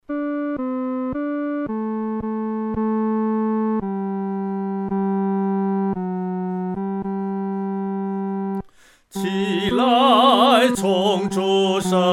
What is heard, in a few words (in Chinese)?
独唱 第三声; 齐来崇拜